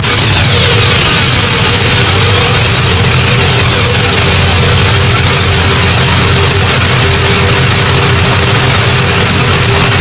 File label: harsh noise noisecore noise ambient electronica; lolicore